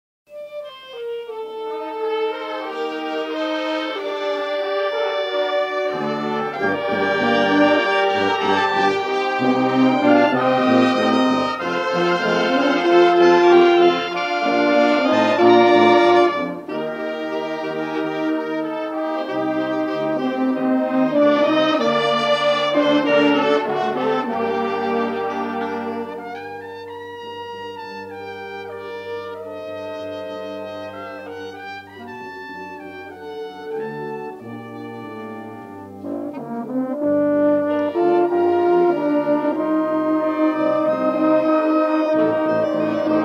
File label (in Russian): Духовой оркестр; С тех пор, как я Христа познал; ЮК Ростов-май 2011